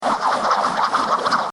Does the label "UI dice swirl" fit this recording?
Yes